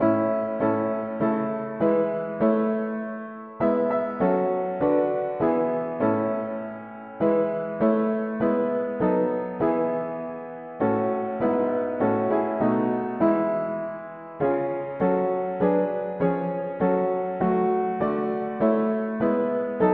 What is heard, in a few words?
mono-piano, hymns; Lord of glory we adore Thee